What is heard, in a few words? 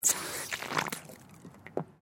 Water Garden Hose Faucet Squirt Sloshy; Yard Tools; Big Room Sound